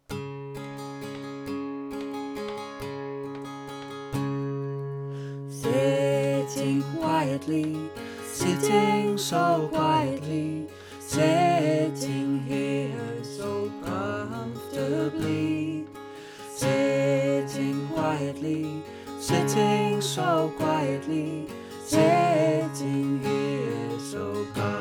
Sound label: Sitting Quietly Full Song